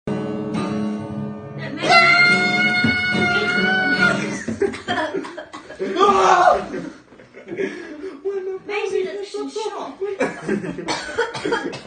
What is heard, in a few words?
song for alert